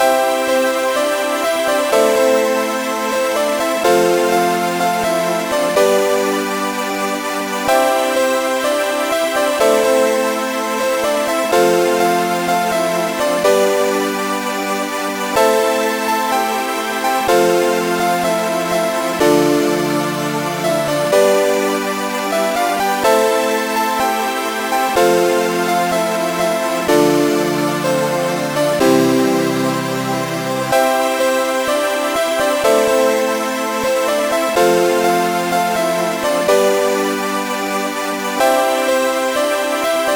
mod piano plink, Trackers; Modland; piano plink2

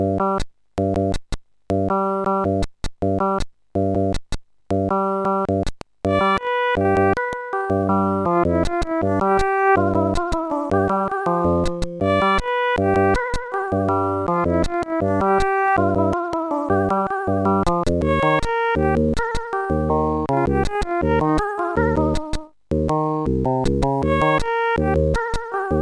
echo rock; The Music Studio

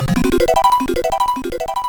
sfx; blinkys scary school; Sound Images; Tony Williams

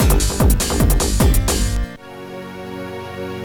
guitar song

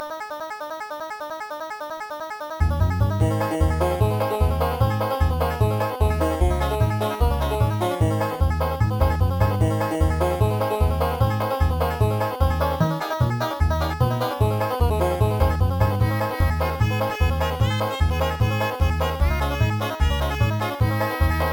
mod banjo

